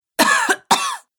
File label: Woman Coughing; Recorded Voice Sound Effects